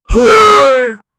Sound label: death of a warrior, gasping